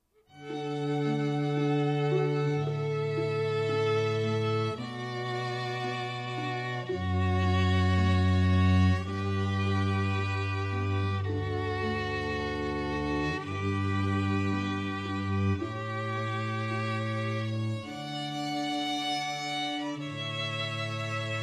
String Quartet

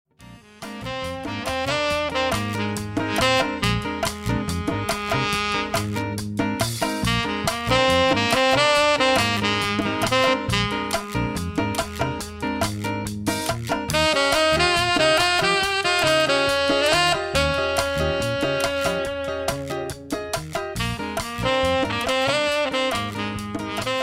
Samba latin jazz

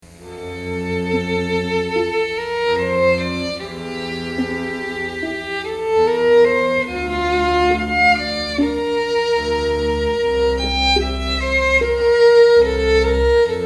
Trio, Classical String Quartet; Bach Arioso

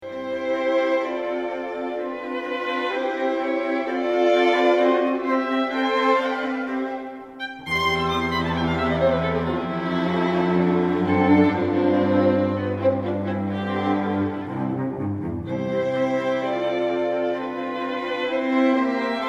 Haydn, string quartet; Eloquence String Quartet

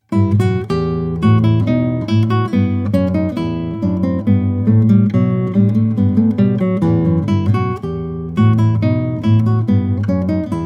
classical guitar

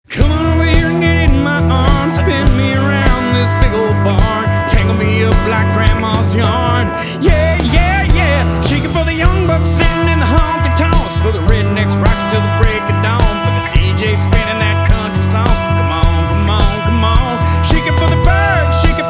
Country Band

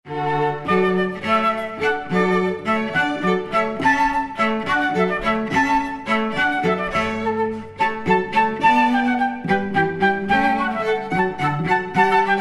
Hornpipe in D, Classical Trio; Handel

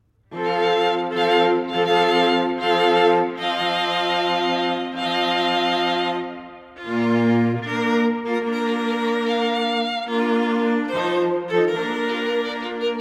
string quartet

